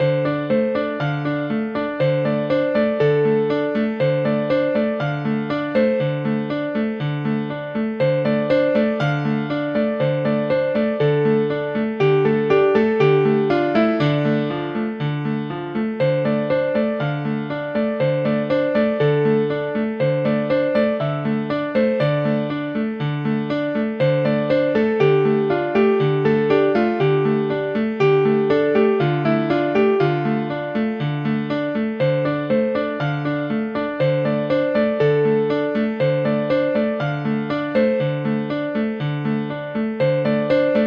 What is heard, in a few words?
akoustik concert grand cathedral; native instruments; akoustik piano